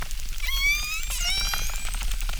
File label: Chirp, acquarium